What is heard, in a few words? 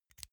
insert xlr extension quick; objekte; elektronik